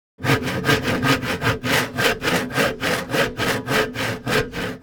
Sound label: Sawing wood fast handsaw